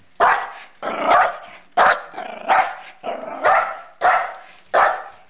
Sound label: woof, eskie; Peppermint